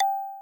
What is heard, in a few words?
ding l